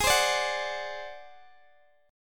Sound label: G sharp 7th Flat 5th G sharp, down, Guitar, Open D